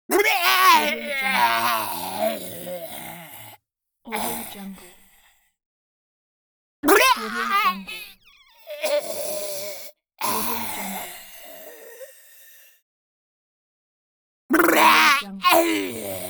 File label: goblin death